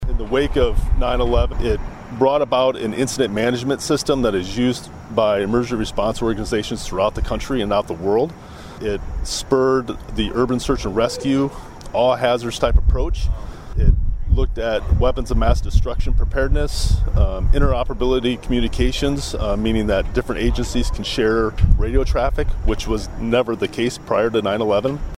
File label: SIREN; sioux city fire rescue pays tribute to 9 11 victims